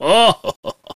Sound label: santa Medium Win; jingle spin